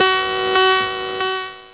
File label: gen alarm, sub sounds